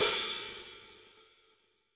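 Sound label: Reg. open hi hat, Roland BK-5 hi hats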